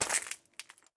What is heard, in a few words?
glass broken footstep; adams venture origins